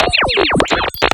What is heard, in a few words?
Laser Fast